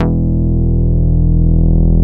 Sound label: MINIMOOG; Greytsounds VOL-1